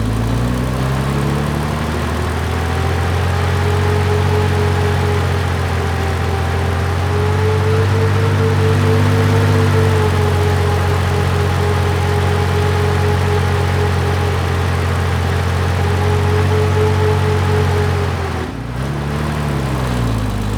vehicle tank drive; teardown ps5 windows xbox series xs gamerip 2022

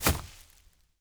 foliage land

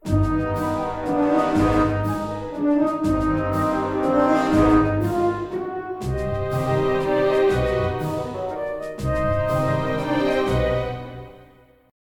skill shot; DW How to Train Your Dragon

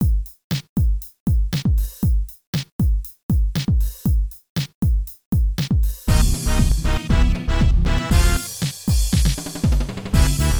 Stage BGM

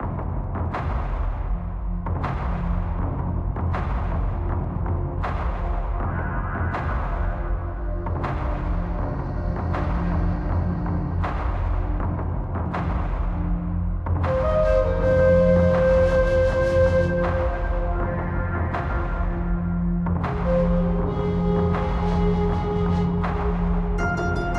dungeon; sword of the necromancer

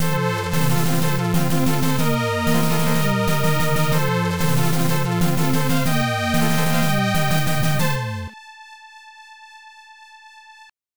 All Clear; P.O.W. Prisoners of War; SNK 68000 arcade

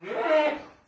Calf Idle; Farm Mania 2

